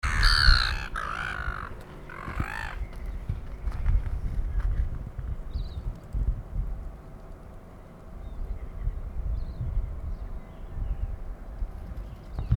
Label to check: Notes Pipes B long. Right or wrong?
Wrong